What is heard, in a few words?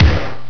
kickdrum